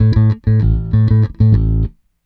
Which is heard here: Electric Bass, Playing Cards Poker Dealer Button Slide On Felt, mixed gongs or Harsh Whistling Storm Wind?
Electric Bass